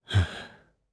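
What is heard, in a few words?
Vox Sigh, Japanese; voices; heroes; Lusikiel; kingsraid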